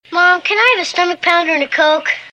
cheer

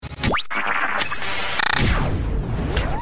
laser

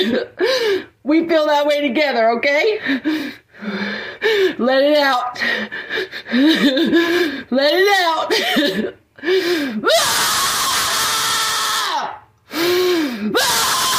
let it out aimughhhhh

